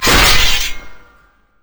basic attack; Yasuo

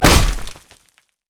missfortune basic attack

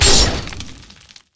power ball; robo rammus

